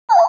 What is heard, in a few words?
crossbills flight